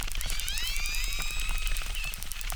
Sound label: Chirp, acquarium